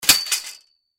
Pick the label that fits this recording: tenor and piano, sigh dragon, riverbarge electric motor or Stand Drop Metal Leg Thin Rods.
Stand Drop Metal Leg Thin Rods